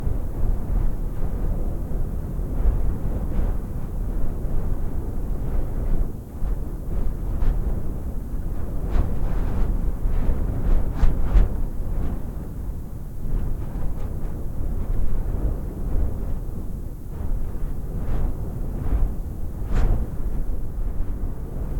Wind desert blustery volcano rim

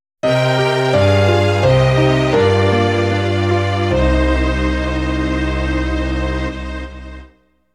GAME OVER SYNTHE Ver.; kenshuui tend dokuta original soundtrack

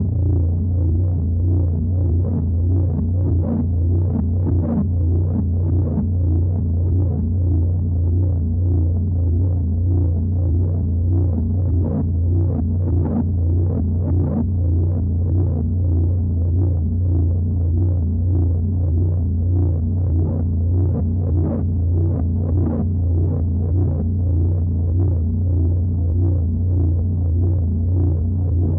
Learning Drone; Growing My Grandpa

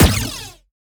Sciweap Shot Small Blaster Energy Thrower

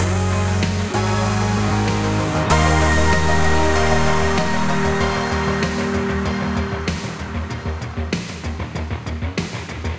synthwave stinger 96bpm; hot lava; ios macos windows

